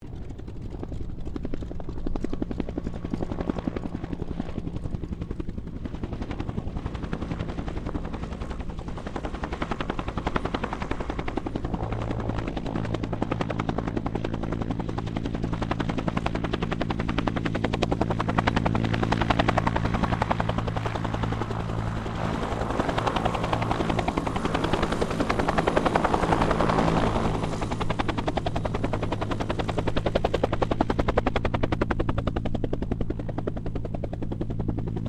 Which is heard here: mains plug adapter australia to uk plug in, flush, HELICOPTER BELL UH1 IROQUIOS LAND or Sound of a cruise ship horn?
HELICOPTER BELL UH1 IROQUIOS LAND